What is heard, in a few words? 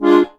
HOHNER, akkordeon